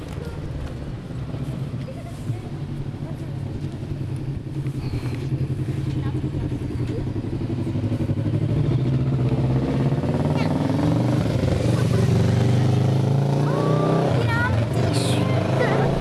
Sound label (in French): moto; Le bourg